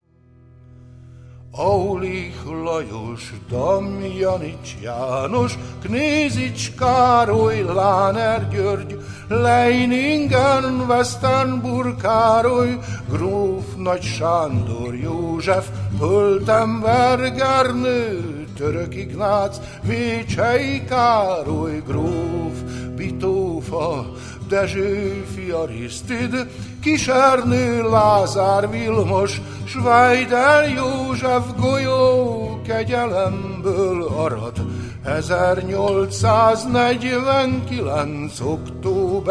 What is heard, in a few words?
tabla